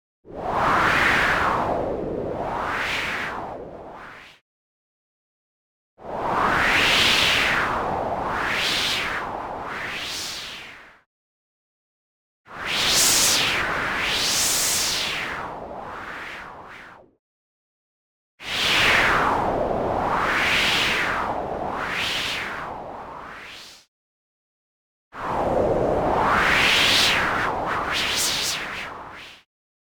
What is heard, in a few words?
movement long, filter white noise; boom library; Anime Essentials